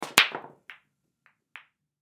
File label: Pool Break Light, Pool Break Light Ricochet; Sports